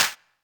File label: Claps, Elektron MachineDrum SPS1 MKII